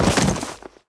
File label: drop